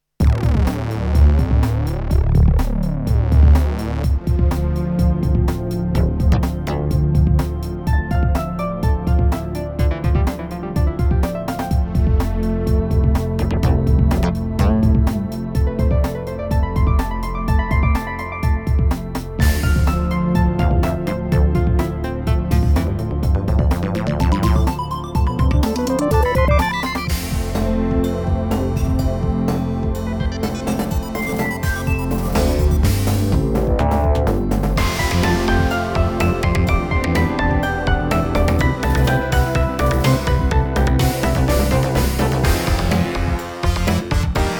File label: ambient